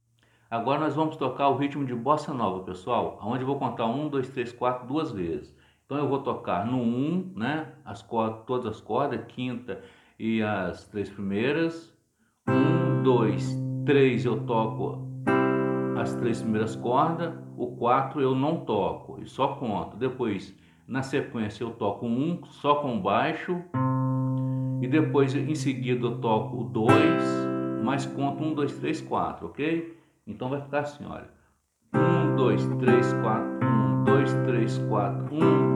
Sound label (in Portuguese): Ritmo de Bossa nova